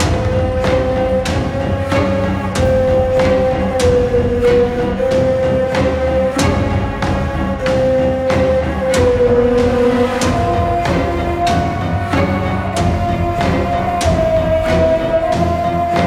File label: Goblin Combat High Intense; song of iron